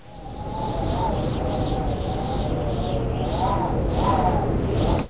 normal Wind; NETSTORM

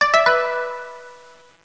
KOTO; INDOORS

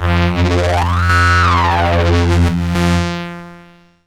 dist sweep, Synth

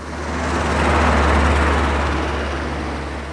CAR PASS; Comedians - The People Behind the Laughter

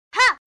girl ha

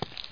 walk; tnpdemo

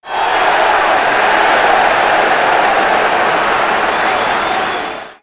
big roar

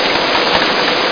rain hevy; baldies

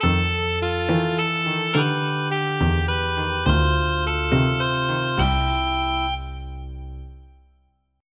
lounge; Wired for Sound Pro CD; Aristosoft, Inc.; music

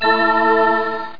open box; landslor

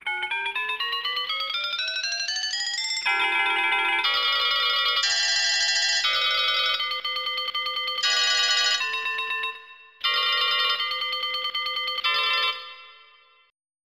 Telephone; EverQuest

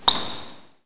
drip; KATANA; Daikatana

